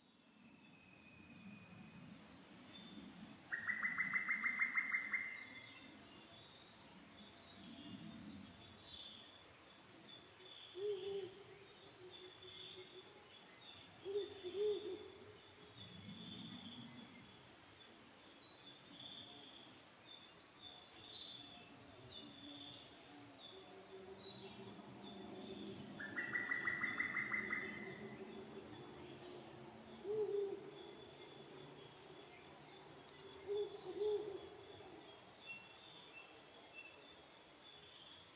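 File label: kera sp, mole cricket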